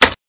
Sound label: hit crossbow boltless